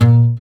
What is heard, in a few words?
GUI AC, GUI Acoust. Slap; Sound & Vision; Gigapack I CD 2; GUI ACOUSTIC 2